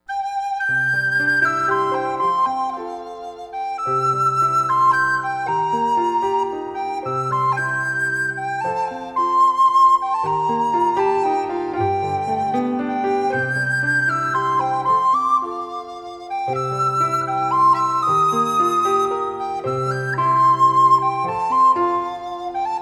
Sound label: Spring Song